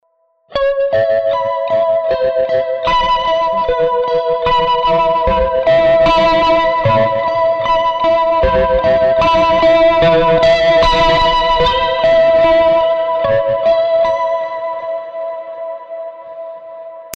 mod delay

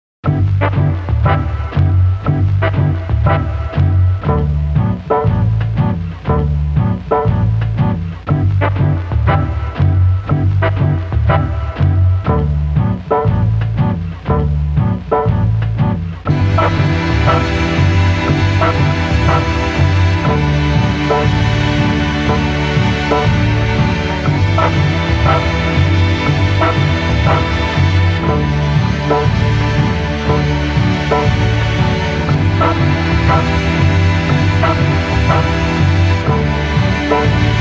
Stringo

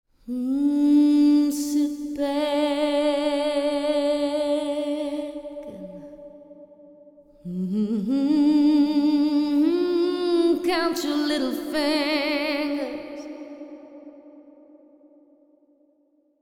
Female Vocal Dream Verb

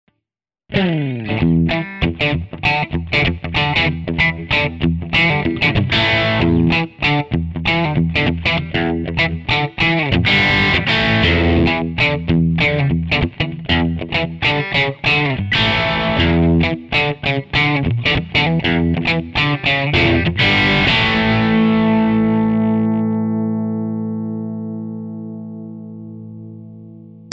FAT crunch

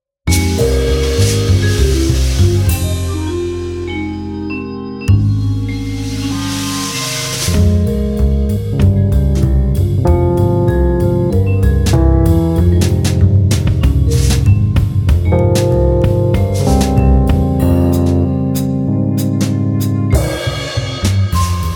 Cool Jazz

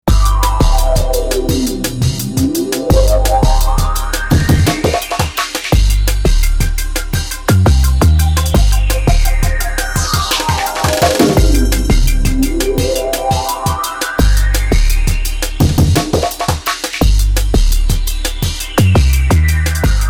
DnB 16

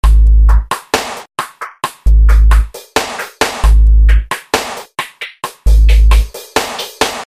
dub kit

